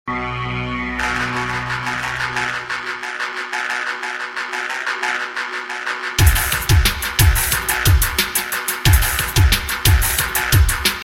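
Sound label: rock music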